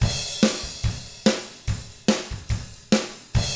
ROCK, Double Platinum Drums

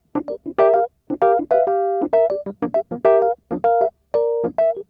Smooth Groove Rhodes, 70s Analog Funk; Sonic Foundry (Sony Creative Software); What it Is!

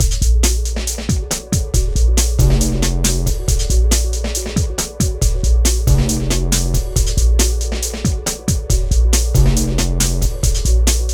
Hardcore Breakbeat, 138 Amin; Big Fish Audio; Hardcore Breakbeat Action; E-thereal

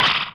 NOISE; AMG - Vince Clarke Lucky Bastard

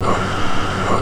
Fx Vox; Equipped Music; Loungin House

